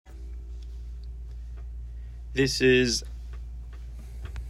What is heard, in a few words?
Piano only; Momentum Performance Group